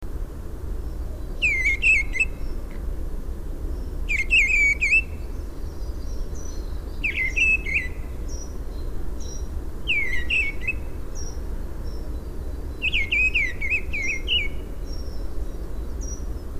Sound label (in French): grive draine chant